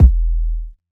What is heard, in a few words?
big kick single hit e key; kick drum samples; drum single hits one shots